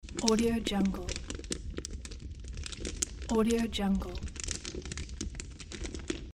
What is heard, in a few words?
fire loop